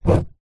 pen ball point write single stroke on empty card box; office